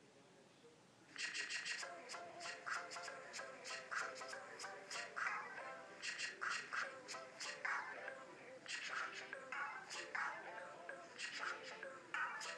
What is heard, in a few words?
jingle bells jingley sounds